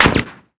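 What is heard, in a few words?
impact explosive round sweetener; Modern Warfare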